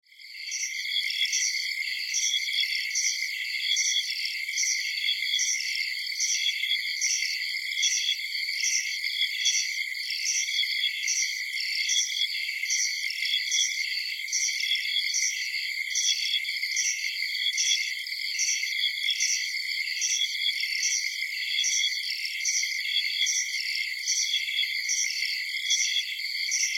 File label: Countryside, Ambience, Countryside, Night, Chirps, Insects, Bugs; 5.1; Surround Sound; BlastWave